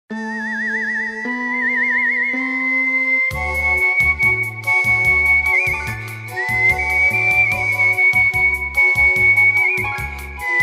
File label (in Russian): svist dlya popugaya, parrot; svist dlya popugaya iz kinofilma muzhchina i zhencshina